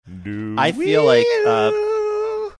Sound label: singing wee bit doo wop